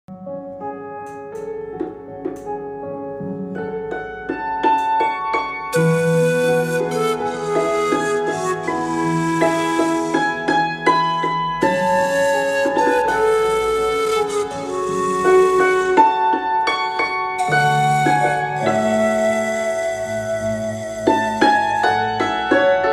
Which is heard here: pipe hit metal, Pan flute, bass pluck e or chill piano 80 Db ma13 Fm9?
Pan flute